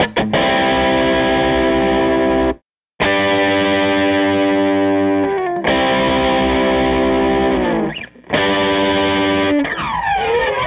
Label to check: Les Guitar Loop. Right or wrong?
Right